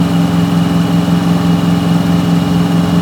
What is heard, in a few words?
Chevy Silverado High